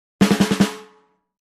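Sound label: Drums Snare March Accent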